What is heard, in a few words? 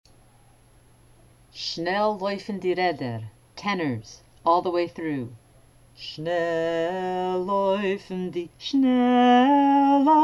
tenor; Shnel Loyfn; shnel loyfn di reder